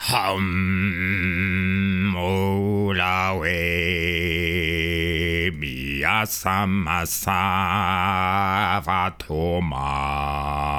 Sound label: Male NPC Song; Hollow Knight: Silksong